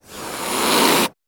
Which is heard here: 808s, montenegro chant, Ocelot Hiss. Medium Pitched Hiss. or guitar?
Ocelot Hiss. Medium Pitched Hiss.